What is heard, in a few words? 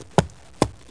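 camel move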